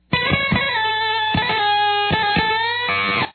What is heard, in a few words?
solo